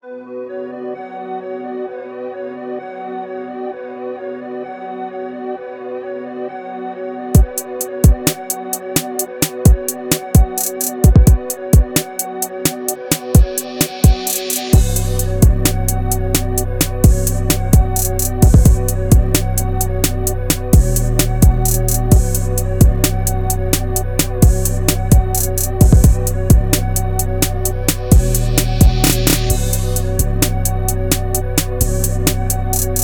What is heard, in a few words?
Reversed Melodies